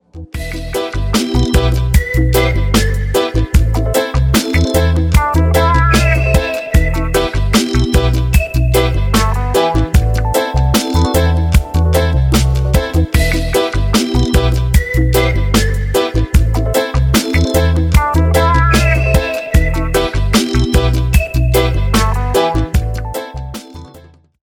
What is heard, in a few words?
ringtone, samsung ringtones